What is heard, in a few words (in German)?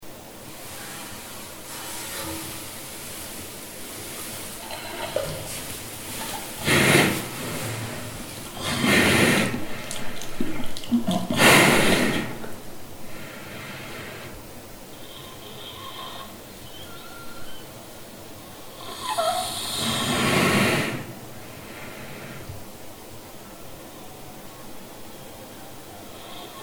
Schnarchen